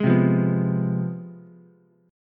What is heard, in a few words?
C Major 7th Suspended 4th Sharp 5th, Ukulele, CM7sus4#5 chord; strummed